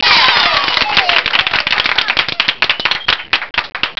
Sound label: cheer